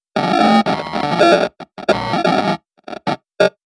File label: computer glitch